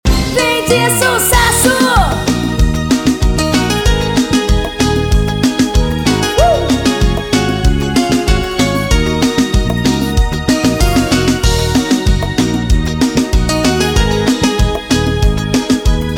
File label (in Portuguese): banda brega pop